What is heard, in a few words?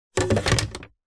hairdryer put into metal holder; household; zapsplat